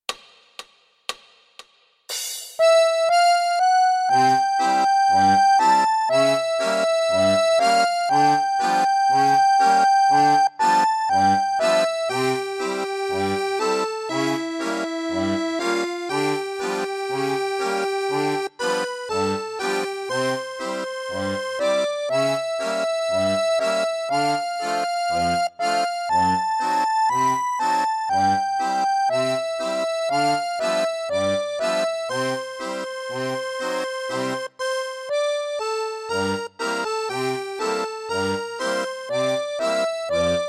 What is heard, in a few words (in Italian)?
Con la Fisarmonica; Anzaghi